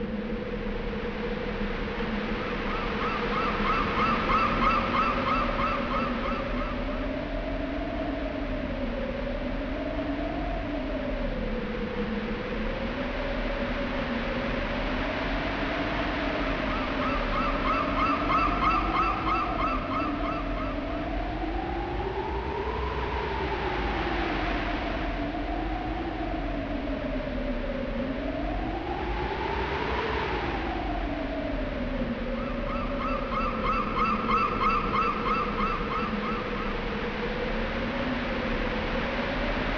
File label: SFX Lighthouse Winds; Banjo Kazooie